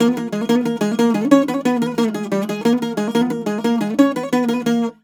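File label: A#, bouzouki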